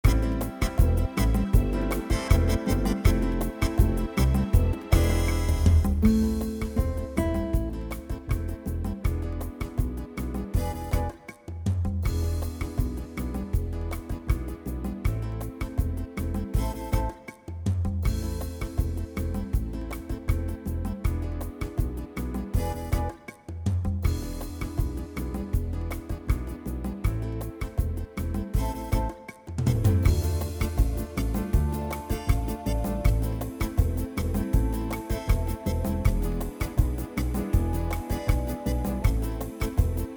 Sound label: Rumba; clavinova festival